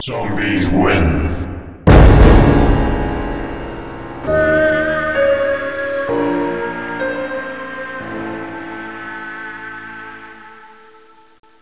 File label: zombi win; cstrike